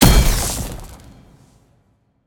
ground slam; smite; npc; grkconqs8 titan chaos; s8chaostitan

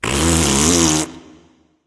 tongue, voice; Smite; ymir skin12

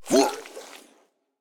minion death grunt; smite; npc; roman archer

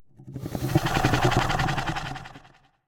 ruckle; smite; god cthulhu

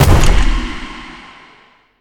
land; smite; god serqet

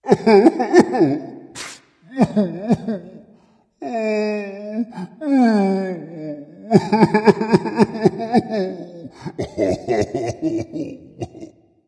boohoo dragon; fafnir

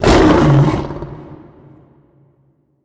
roar; smite; chaos titan

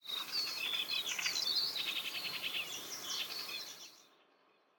ambience bad birds; smite; conquest

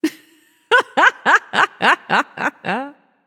laugh; smite; voicepack; hera

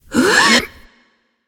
breath i; babayaga

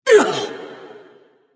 grunt hurt; smite; thanatos

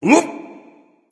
ouch, voice; Smite; ymir skin9

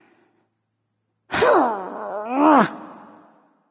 ugh; cerberus

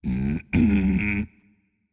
tongue, voicepack; smite; jorm

